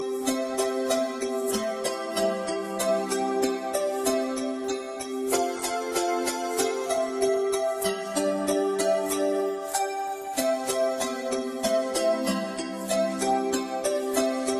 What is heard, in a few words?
Jingle Bells